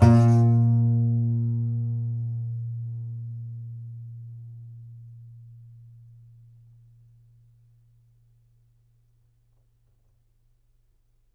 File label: C. Bass Balalaika, Plucked Strings; E-MU Producer Series Vol. 7 – Old World Instruments